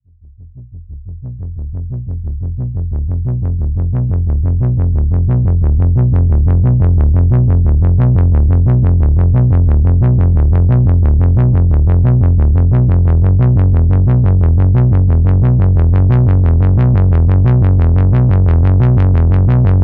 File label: arpeggio